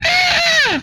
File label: Death, Vocal; retro arcade